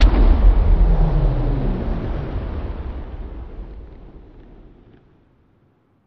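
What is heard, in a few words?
Rocket Shooting By Longer Attack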